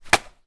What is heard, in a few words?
foley clothes wet shorts throw at wall; zapsplat